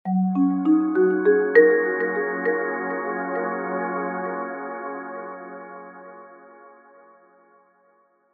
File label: multimedia signaure warm soft marimba pad positive